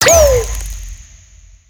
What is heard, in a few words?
Major Success Overlay